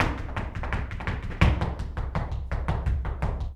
Flamenco, Tapping, Zapateado Solo

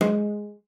CELLO FINGER G#3; Xsample VOL-5

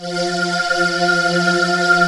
AIR VOX F3; AKAI S-Series CD-ROM Sound Library VOL-7; AIR VOICE 1

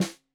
Snare 65 Reg Stereo, Deeply Sampled Drums

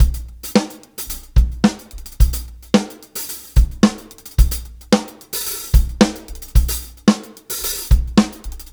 Live Beats, R&B Drums

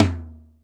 TOM LO; Back In Time Records; Big Bang

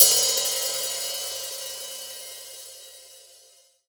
Y9 Hat open; Akai MPC500

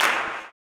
CLAP, blues & soul; ILIO; Vocal Planet

